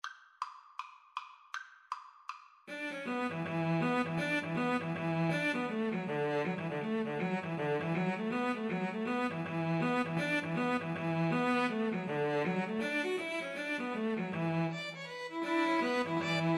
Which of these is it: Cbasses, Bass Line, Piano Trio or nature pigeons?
Piano Trio